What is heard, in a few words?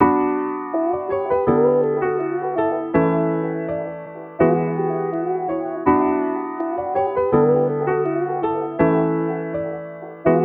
164 BPM Bm; Joker